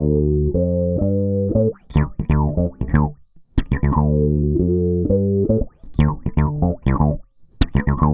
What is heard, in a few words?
DISCO BASS, 118 BPM, D